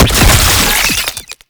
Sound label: Oraxia Brood Spider Explosion; Warframe